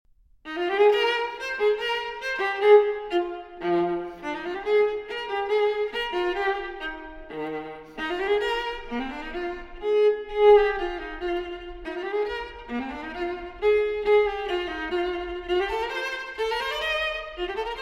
Cello Suite No. 4 in E-Flat Major Transcr. For Viola Bourrée; J.S. Bach; BWV 1010